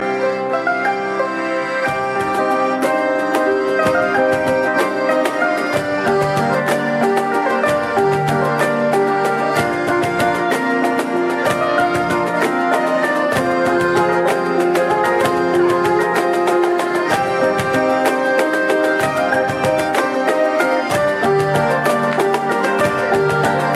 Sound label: the harp the accordion